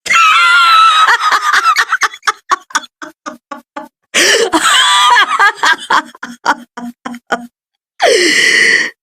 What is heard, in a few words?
insane laugh; koma